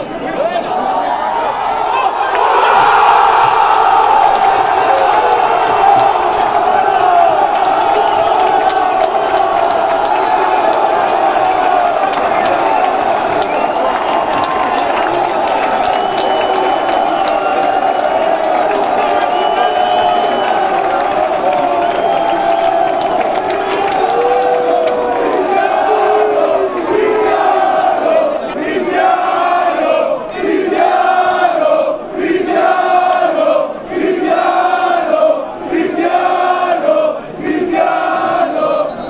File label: gol, fan chants; cristiano